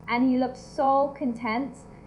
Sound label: beat